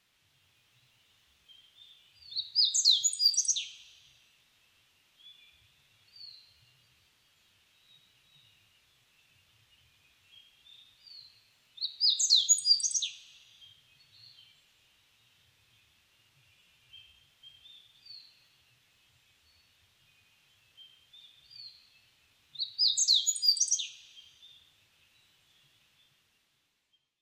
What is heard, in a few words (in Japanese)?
hoojiro